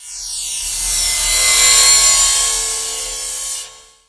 portal, mob

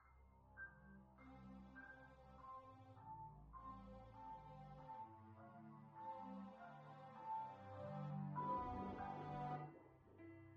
calm, music

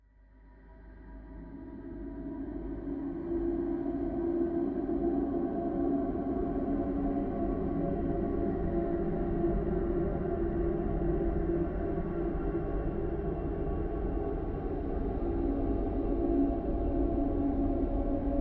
space, ambient

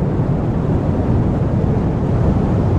meteor fall; mob

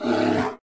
bear grunt; mob

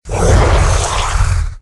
exit, elemental; mob

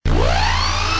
start hit, robot; mob

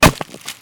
wooden, damage

